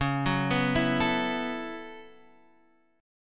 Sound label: B 7th Suspended 2nd Suspended 4th C sharp